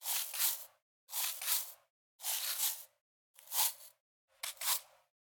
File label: Dust Rustle